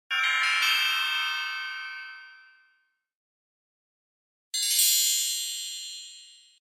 Music logo - High triangle chime for announcements x2; Hollywood Edge Sound Designers Tool Kit